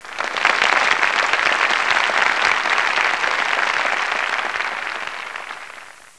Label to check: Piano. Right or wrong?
Wrong